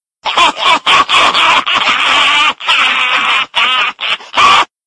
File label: random dramatic laught; youtube